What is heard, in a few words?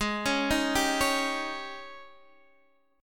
Ab Major 7th Suspended 4th Ab Guitar Half Step Down